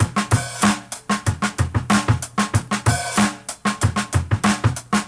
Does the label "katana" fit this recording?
No